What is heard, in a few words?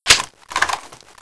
mp5 clip out; csof